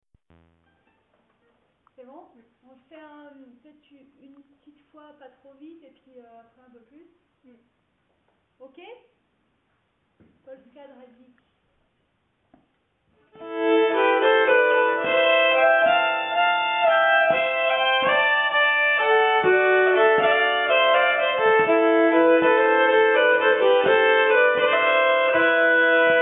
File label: Rattwik Polska, nyckelharpa